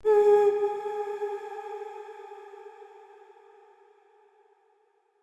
Statue Voice G Sharp; Player Instrument; Statues

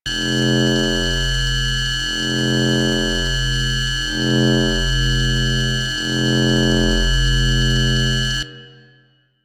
Computer Terminal Loop; Ambience; Props; Warframe; The New War; Zariman; Quests

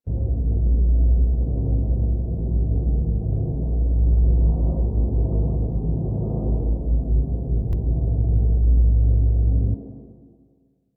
Main Room Absence Loop, Ambience; Apostasy

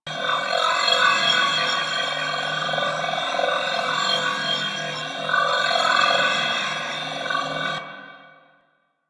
Light Loop; Warframe; Harlequin

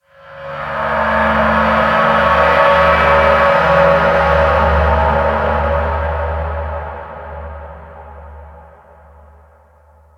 Hub Tonal One Off; Steel Meridian; Warframe; Ambience; Tenno

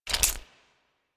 Flamethrower Reload; Warframe; Weapons; Ember Deluxe II Flamethrower Skin

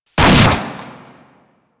Bronco Fire Shot 2D; Warframe; Weapons

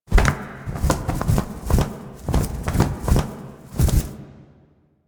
Owl Alerted Stun; Duviri; Animals

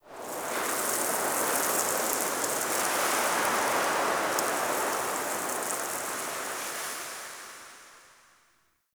Sandy Wind; Ambience; Man In The Wall

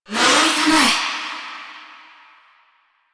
Omamori Charge; Warframe; Koumei

